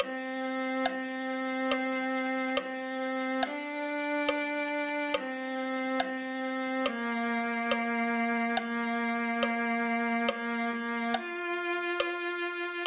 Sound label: viola